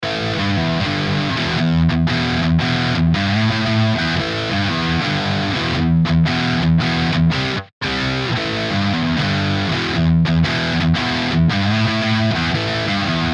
Rock Guitar Dry

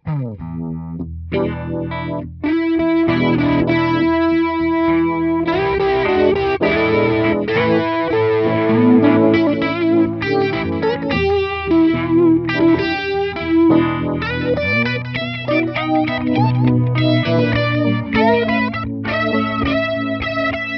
Blues Deluxe1 Overdrive Flanger Delay, guitar; Blues – Deluxe1 – SHOD Overdrive – UV Vibe – MissTress Flanger – Carbon Delay; ReTube Custom Shop

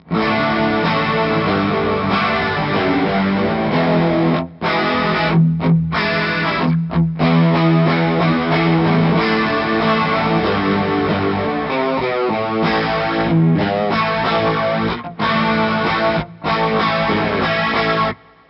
overdrive C2, Demo overdrive + C2; classic guitar compressor pedal

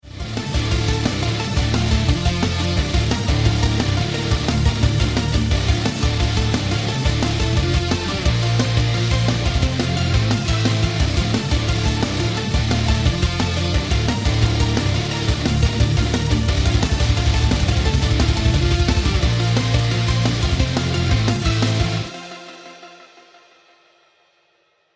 Modern Metal Drums DRY; Mattertone

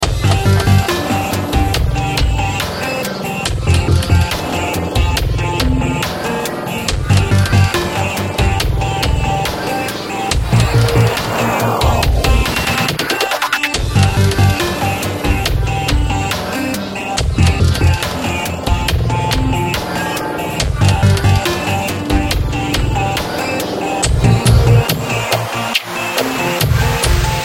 Disto V2 Parallel Master Bus DRY

